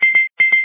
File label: alarm